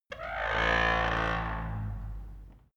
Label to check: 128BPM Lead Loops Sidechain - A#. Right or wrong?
Wrong